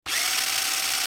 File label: robot wheels; jetpackLearningPlatform; crack-the-code